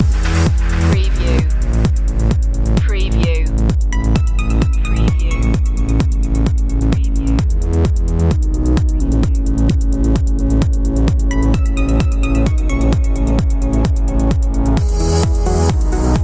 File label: the club loop